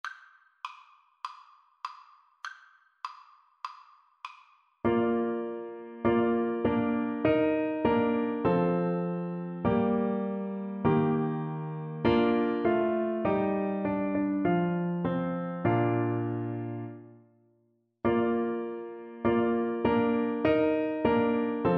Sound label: lo how a rose, Viola